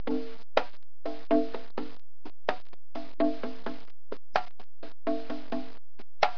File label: musical